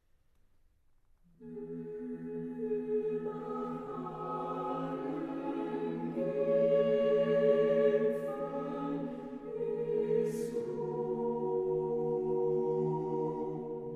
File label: vocaal ensemble, muziek; paniek